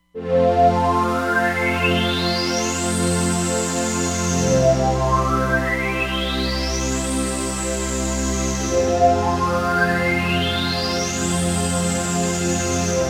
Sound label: OB Rezo Pad, Roland Fantom XR